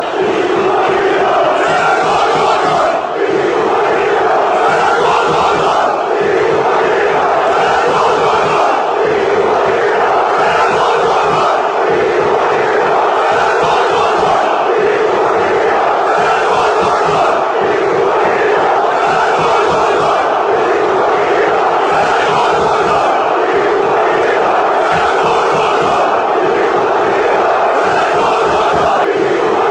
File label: fener gol gol gol, fanchants